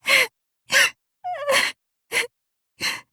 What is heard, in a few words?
crying